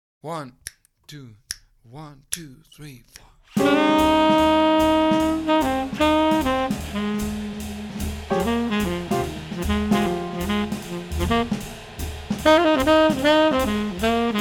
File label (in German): f aeolisch play along